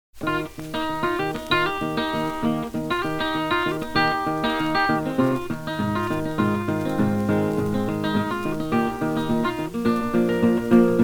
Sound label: Stack Olee blues